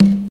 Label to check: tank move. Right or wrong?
Wrong